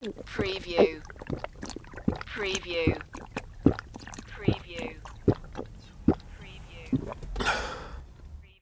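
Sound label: Drink Water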